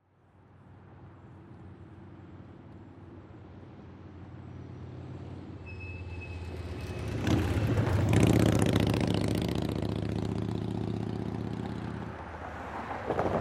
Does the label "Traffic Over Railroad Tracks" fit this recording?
Yes